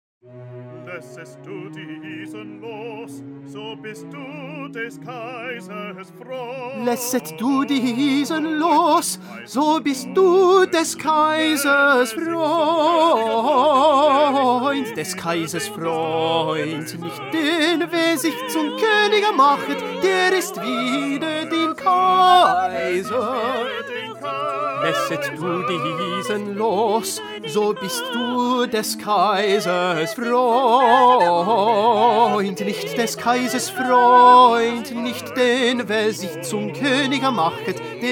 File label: Tenor; Bach; St John Passion